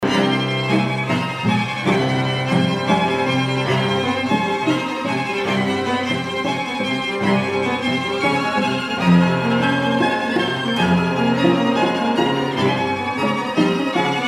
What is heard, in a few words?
PIANO QUINTET; 2nd mov